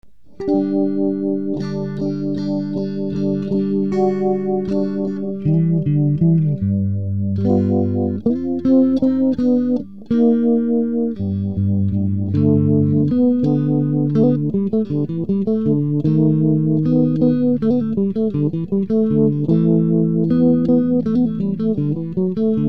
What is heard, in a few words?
Easy Vibe